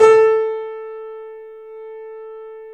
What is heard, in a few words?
A, The Piano; InVision Interactive - The Piano